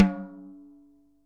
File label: snare dry; Real Mega Drums